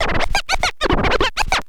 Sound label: scratch short, Vinyl scratches